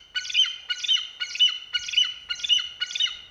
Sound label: eagle; ambient; anubis